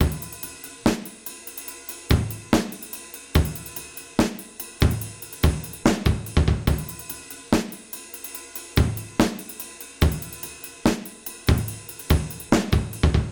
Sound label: acoustic drum loop